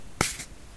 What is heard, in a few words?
card Shove